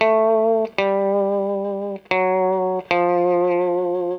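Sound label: guitar, Roots Disco; USB Soundscan vol.32; AKAI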